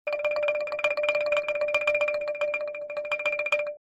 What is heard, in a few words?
Cartoon running movement funny